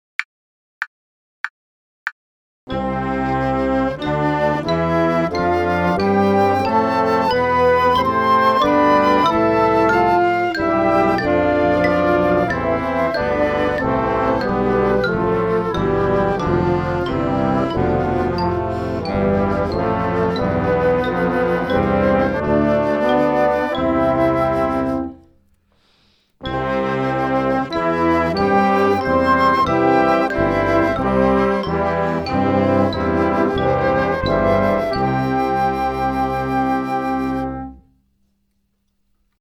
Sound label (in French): gamme de do polyphonique noire à 96, accompagnements